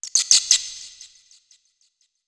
environment forest bat cackle

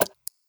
arrow show

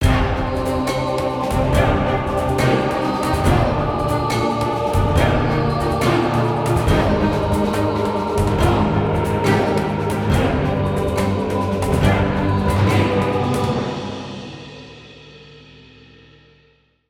Battle Drums; Necropolis

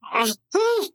inmate pain

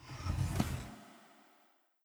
dialogue window slide down

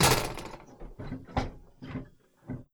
GATE Steam Train Close; mono

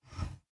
small window open

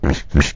Pig Idle

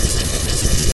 Laser Beam Loop

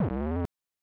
Open Door; Wrecking Crew 98

